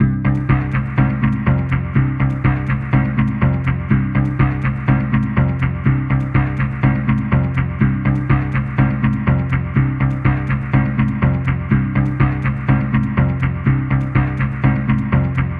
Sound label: Combo Loop 123BPM Cmin